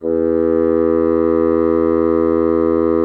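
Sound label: bassoon mezzo E3; Xsample VOL-6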